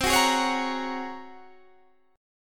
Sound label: G sharp 11th C